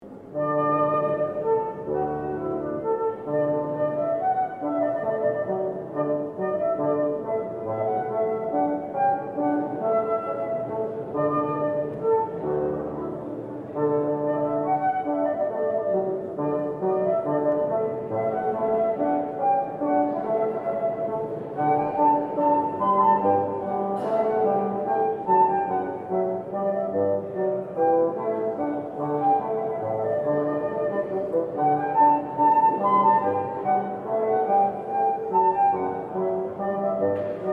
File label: duo hornpipe D